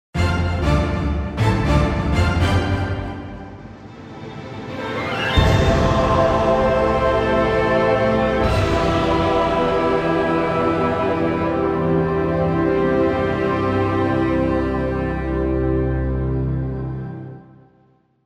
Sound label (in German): Orchester Finale